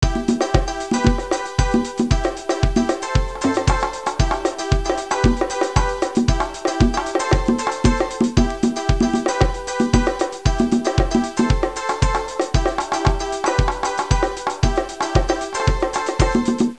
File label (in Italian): musica traditional est; fareast